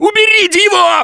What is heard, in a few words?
scream while pounced; Gambler; russian